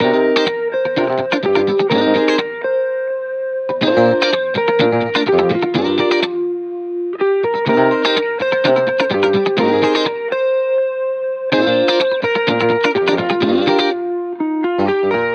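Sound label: Guitar Bpm125 Key C minor Full, 70s Guitars Full Mixes; Fox Samples; Back to the 70s Guitars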